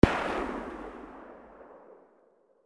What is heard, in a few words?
awp, distant sound; garrysmod